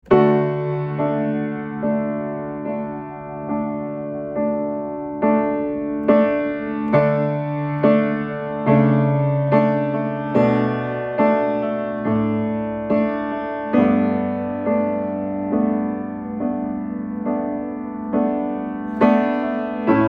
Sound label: Piano Piano